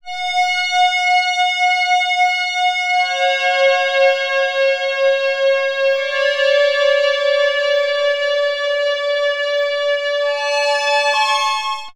PAD, Drum & Bass; Best Service ProSamples vol.03 - Drum & Bass 1; AKAI

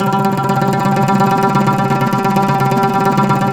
OUD TREM G 1; Soundscan vol.53; Mediteranean Traditions; AKAI

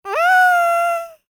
LICKS, Vocal Planet, Jazz & FX; ILIO

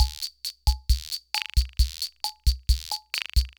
Loop, sr-95; classic machines

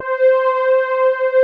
SMOOTH OBPD, synths; Pro-Rec - Synthsonic

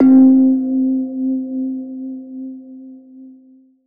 Pluck 10 - C; 99Sounds; Monosounds; Music Loops; Instrument Oneshots

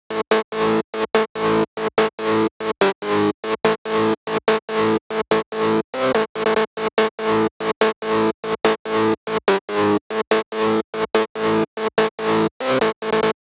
144 BPM Verse - Acid DRY; Vengeance - Essential Clubsounds Vol.5; Flight Kit Root Amin; Melody & Synth

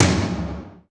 Dance Kit, Korg 01W

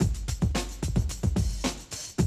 110, Classic Drum Loops

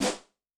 Filt roll, Yamaha DTXpress-II snare

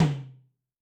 Ind Tm F, Yamaha DTXpress-II

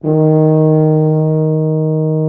Orchestral Brass

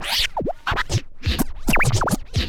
SCRATCHES

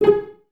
CES G#4 Pizz, Cellos, String Ensembles; Miroslav Vitous

